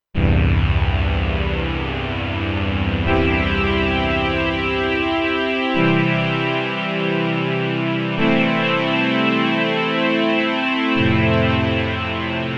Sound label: PAD DSAW; Bank PAD1; Patch DSAW